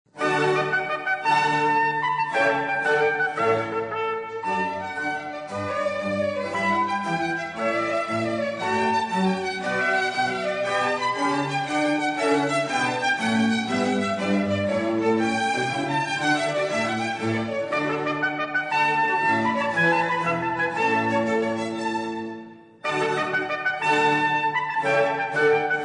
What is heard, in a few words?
watermusic; handel